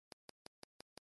pluck

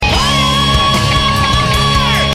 faaaaaieeerrrr

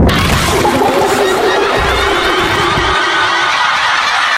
zombie scream

